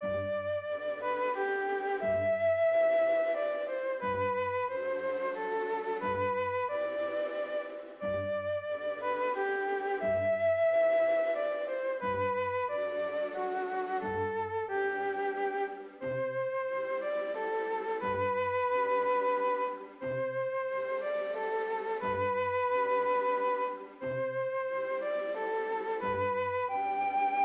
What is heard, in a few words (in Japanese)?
quartet, バイオリンⅠ、バイオリンⅡ、ビオラ、チェロの編成で、弦楽合奏の伴奏; このフルートの旋律に、 / バイオリンⅠ、バイオリンⅡ、ビオラ、チェロの編成で、 / 弦楽合奏の伴奏をつけました。; ハーモニーの最高音を順次進行にして、 / 旋律との関係性を持ちながら、 / おだやかな印象が与えられています。